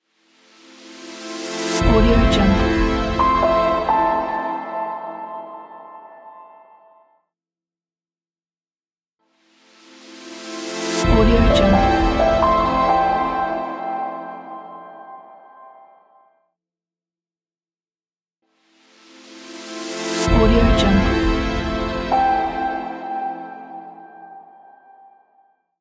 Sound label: outro logo